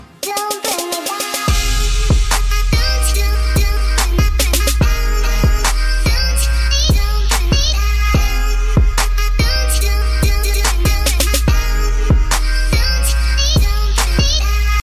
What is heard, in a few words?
trap